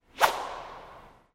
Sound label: clear balls